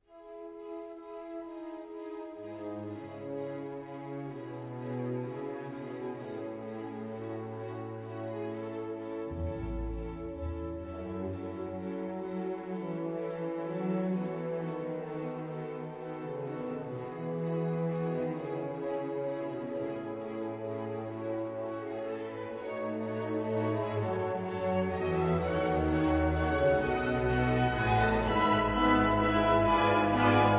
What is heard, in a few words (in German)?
Orchestervorspiel E-Dur; Hans Rott